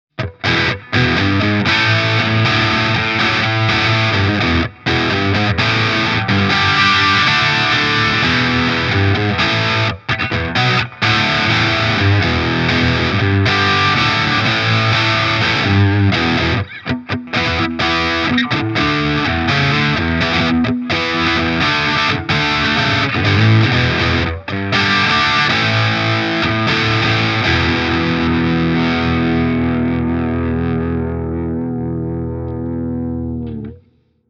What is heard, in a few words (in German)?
Stegtonabnehmer HB Rhythmplay crunch; Harley Benton MR Modern CAR